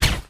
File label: silenced pistol